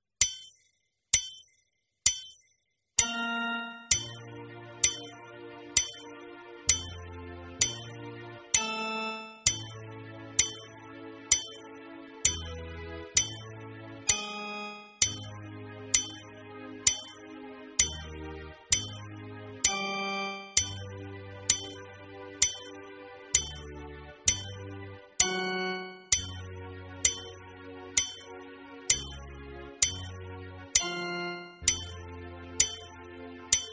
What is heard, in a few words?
warm up exercises